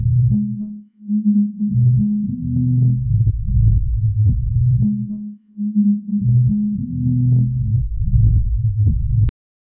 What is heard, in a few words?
dark ambient loop